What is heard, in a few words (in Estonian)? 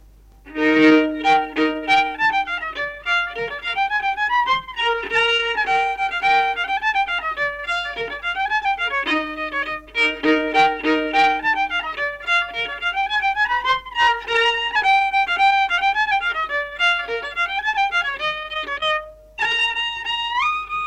polka Ruhnu viiul folk; Oma tehtud polka